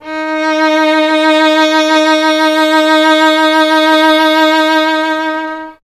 violin, Solo Instruments

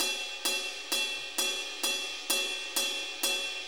4-4 HiHat Loop

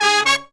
120BPM, Horns

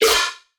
synth hit tech yell, F; Virtual Riot

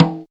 bongo, Yamaha TG-500

